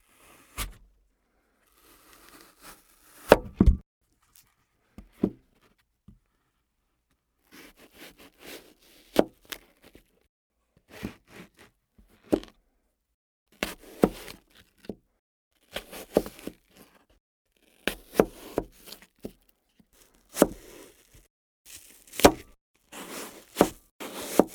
apple pear cut

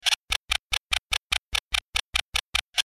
comical footstep

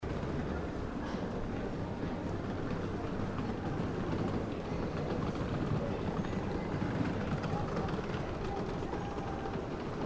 Tenjin Underground

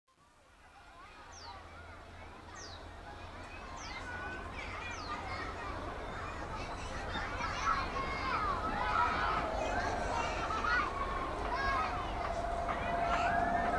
park

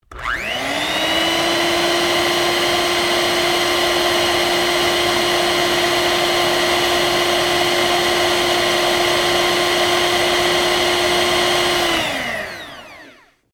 hand mixer

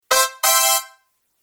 comical fanfare

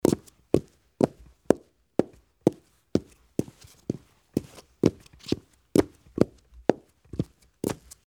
footstep slippers

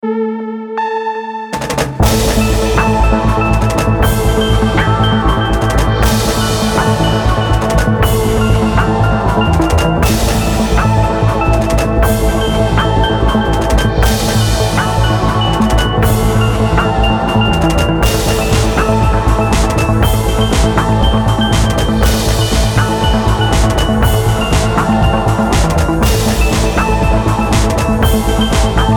chase

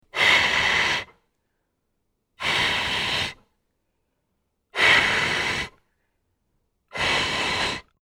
breath fu fu

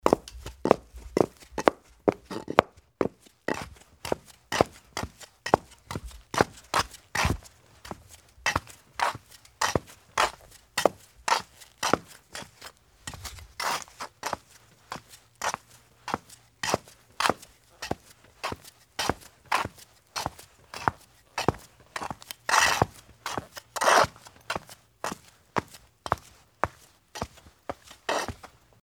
footstep Geta

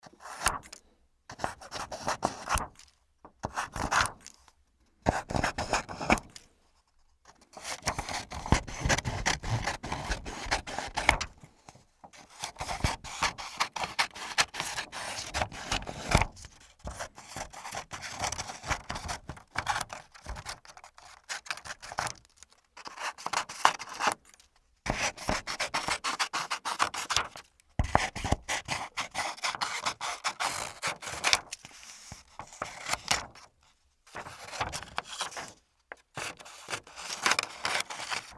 scissors

